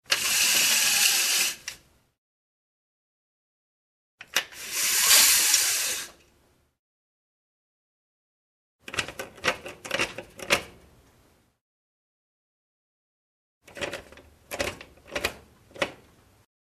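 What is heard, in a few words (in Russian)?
жалюзи поднимают опускают. окно.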